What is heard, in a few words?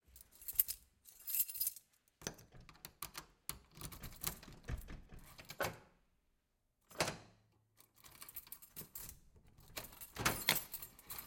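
Armored Door - Metal and wood Front door - Unlock and Lock by the Key; SculpTunes; HHDoors; Household Doors and furnitures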